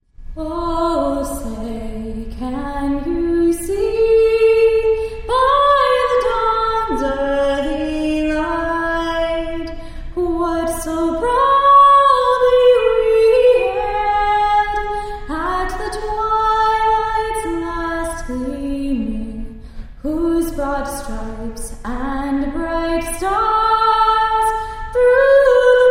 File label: National Anthem